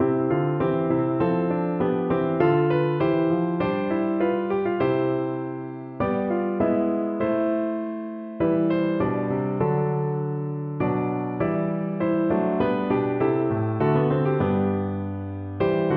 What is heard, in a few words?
all my heart, piano